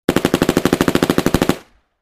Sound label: 9mm Uzi Automatic Sin